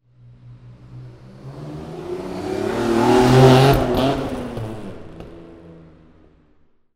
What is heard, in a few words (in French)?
vehicule passage